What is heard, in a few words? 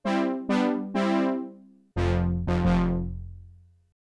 Brass; opxpro2; Riddle